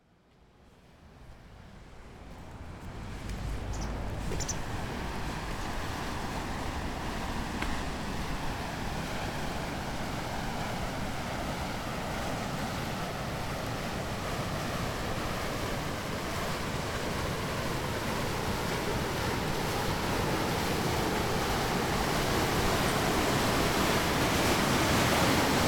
tenryuugawa ni nagare yuku oto kamaguchi suimon; 天竜川に流れゆく音（釜口水門）

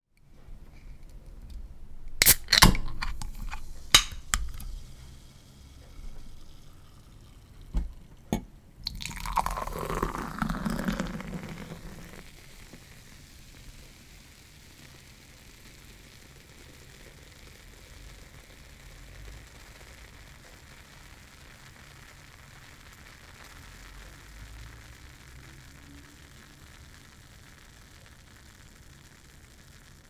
awa biiru; 泡（ビール）

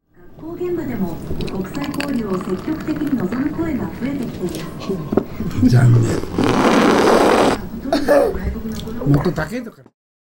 biiru; ビール

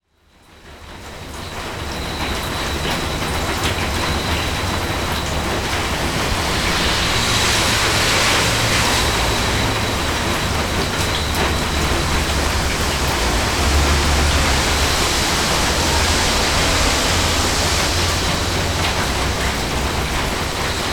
koburi ni natta ame to mushi no koe; Chino Cultural Complex

